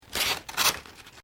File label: PAPER CUT SHORT; Material components for constructing more complex sounds; Sound Effects:Materials; Foundation3